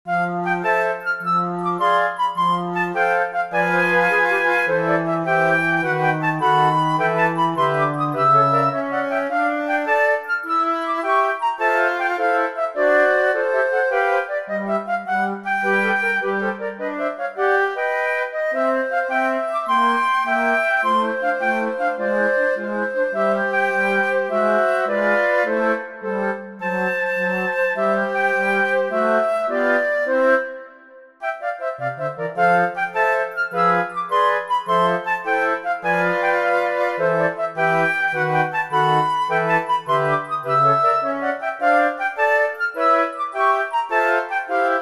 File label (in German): Floeten Quintett; Humorvoller Abschluss